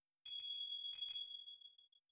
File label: Windows 6 Interface Manager Startup & Shutdown; Windows Never Released Soundpack 2